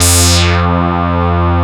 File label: OSCAR F2, synthesizer; Hollywood Edge - Giorgio Moroder Rare Synthesizer Collection